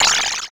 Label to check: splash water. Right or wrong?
Right